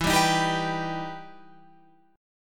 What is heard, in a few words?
E Minor Major 7th, Guitar, Open F; 16,14,15,14,14,14; strummed; JGuitar